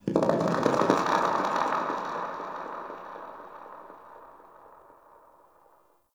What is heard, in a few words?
Exterior Space Rings Details, Ambience; Warframe; Railjack